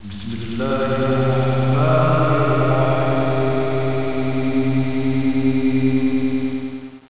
echo

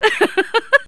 GIGGLE

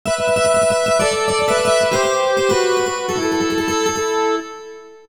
mandolin; AWE64

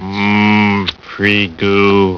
goo

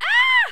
SCREAM

